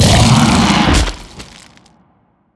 worm death, creatures; Factorio

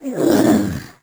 confused; Crypt of the NecroDancer

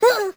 squished; Crypt of the NecroDancer

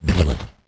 slime death; Crypt of the NecroDancer

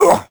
squish; Crypt of the NecroDancer

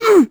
squish; Crypt of the NecroDancer

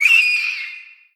banshee hit; Crypt of the NecroDancer

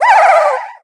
mommy cry; Crypt of the NecroDancer

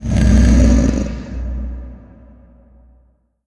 coralriff hit; Crypt of the NecroDancer

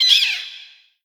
ghoul death; Crypt of the NecroDancer

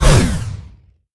charge instant; deadringer; Crypt of the NecroDancer

